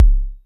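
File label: vermona drm1 mk3 bass drum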